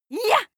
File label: female shout take swing; schreie; menschen